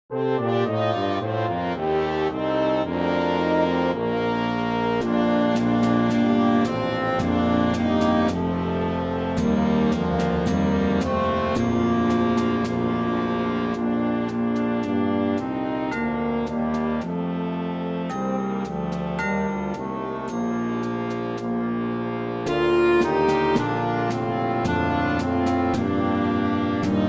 Clarinet track with Melody; Good King Wenceslas

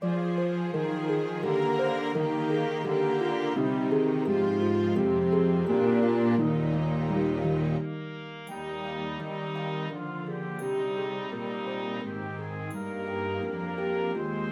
Trumpet Track with Melody; Away in a Manger

